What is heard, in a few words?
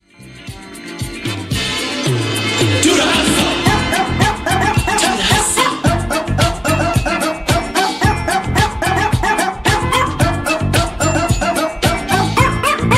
dog